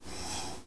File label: Air, Train; OpenBVE